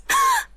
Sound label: gasp; tamamo